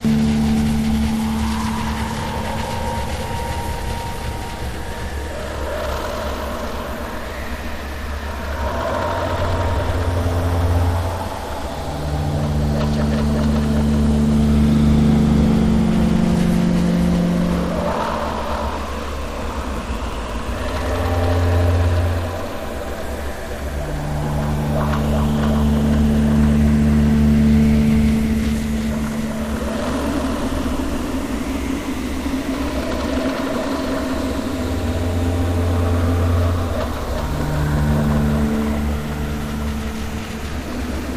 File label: Dust Cloud rushing wind tones with low pitch drones